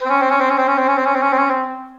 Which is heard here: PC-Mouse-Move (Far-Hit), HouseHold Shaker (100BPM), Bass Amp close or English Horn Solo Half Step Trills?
English Horn Solo Half Step Trills